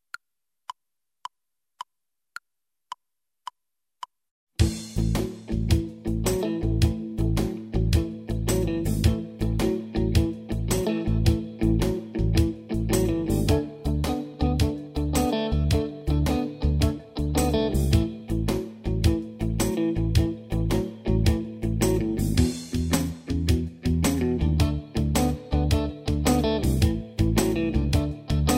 rytm bluesa w A, gitara

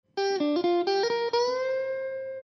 zagrywka g-moll, gitara

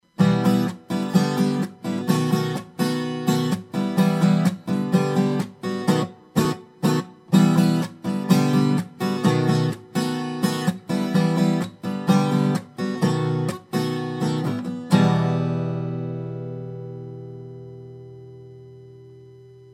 wstep

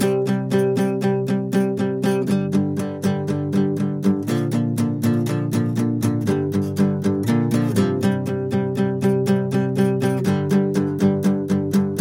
zwrotka gitara

